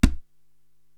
Conga Heel; Korg TR-Rack

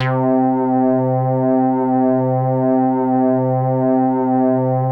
LIGHT SYNC, MOOG, Bass; Masterbits CLIMAX 7; MORE MOOG

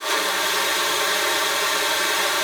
WEQS B3, ALTOSAX; Xsample VOL-2

